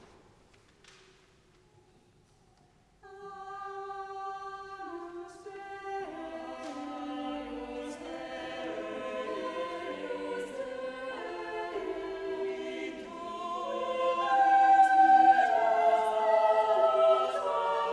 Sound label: Agnus, lgchoir